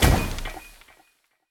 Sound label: Item Breaks in Hand Stinger